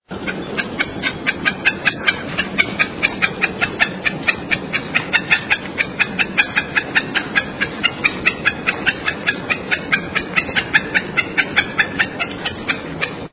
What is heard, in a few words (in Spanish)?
voz chiricote